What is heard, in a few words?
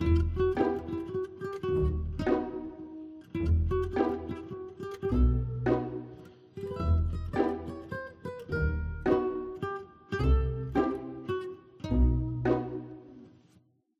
Guitar; Oliveira Layer A; tintin reporter cigars of the pharaoh